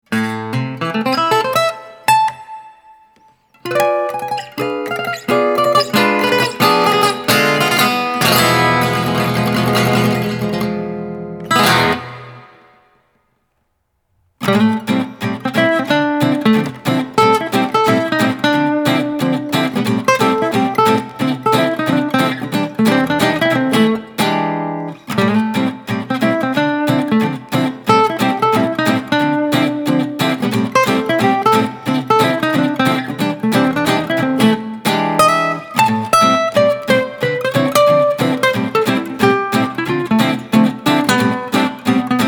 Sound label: Guitar Ver.; Missing 9; Korea